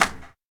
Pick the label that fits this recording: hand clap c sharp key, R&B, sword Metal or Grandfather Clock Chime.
hand clap c sharp key